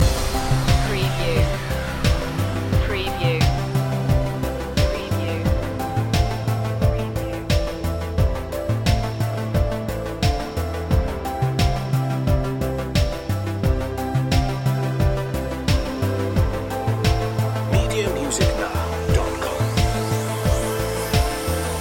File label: falling blossom loop